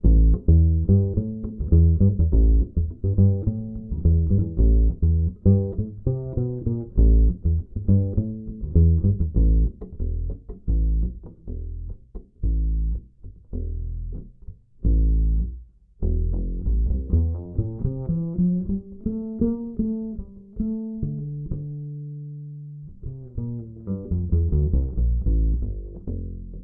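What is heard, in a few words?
acoustic bass Plucked direct input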